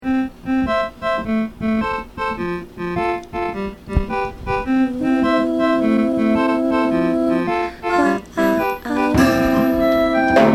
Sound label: Drumkit Song